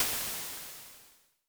Crash Waves, Vermona Mono15 Drums

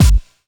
KIT, Dance Mega Drums; Best Service

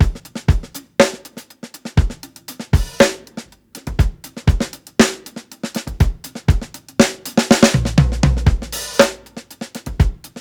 120 HALF TIME IMPROV, DRUMS LOOPS; BASEMENT DRUMS VOL 2

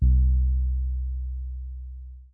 LOWER BASS; TECHNO-BOX; SYNTH+BASS

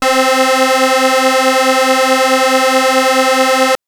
Synth Pulse Pad c3

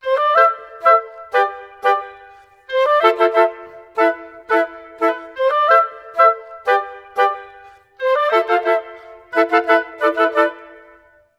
90 A min, Woodwinds; PowerFX; Downtown Orchestra

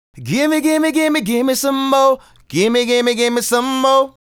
Male Solo, Vocal

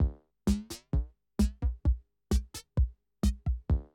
130, Classic DrumLoops

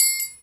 triangle; InVision Interactive

